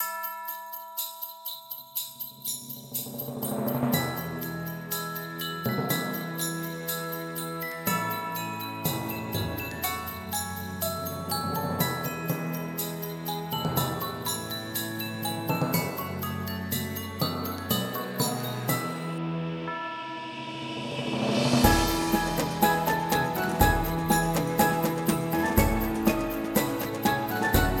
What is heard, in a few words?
Country Happy Cheerful Strings; 宝宝婴儿摇篮曲